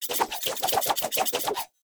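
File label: Dagger Projectile Whoosh; Weapons; Enemy; Daggers; Sinecure Dagger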